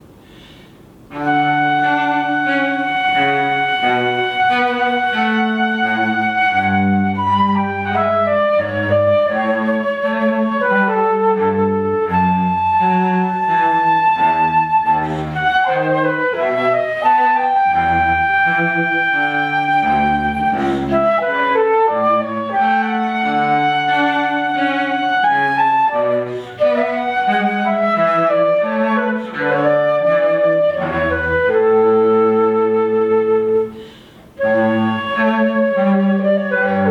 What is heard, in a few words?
Air on a G string, flute; J.S. Bach